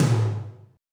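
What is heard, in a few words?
tom, real mega drums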